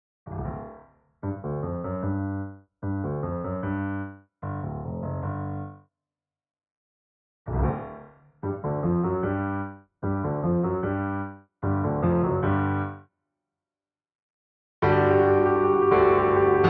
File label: The Forest Medley (Piano); Super Meat Boy!